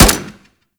Fire Mech, Weapons; Warframe; Burston

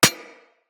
Bullet Impact Generic Metal; Weapons; Surface

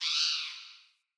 Bird Impact Chirp; Pothia Bird

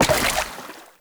Step Water; Warframe; Oraxia; Foley

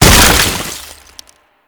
Frost Avalanche Ice Chunk; Warframe

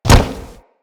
Step Metal, Footsteps; Grineer

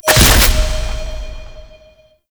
Modifier Pickup; Gameplay; Warframe; NineteeenNinetyNine; PvPvE

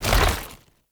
Tank Infested Arm Move; Enemies; Movement; Lasrian Tank; Warframe

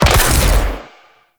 Portal Beam Fire; Enemies; Abilities; Warframe; Zariman; Void Angel

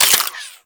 Modular Secondary Handle Fast Reload, Guns; Warframe; SU Modular Guns; Reloads

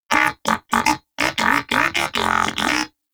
Pet Tenacious Skip Rope; Pets; Warframe; Moa

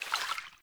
Vial Foley; Warframe; Warframes; Alchemist

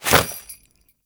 Jump; Warframe; Grineer; Royal Jester; Foley; Jumps

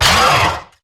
Necramech Melee Pain; Battle Barks

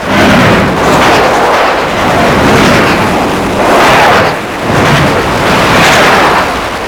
Air Rush Loop, Surface Air Rush; Warframe; Archwing

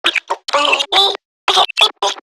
Garden Drone Vocal; Warframe; Zariman; Zariman Gardener Drone

